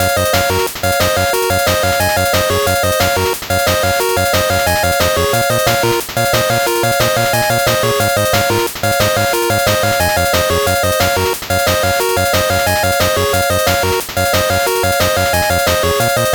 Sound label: 8bit bgm fast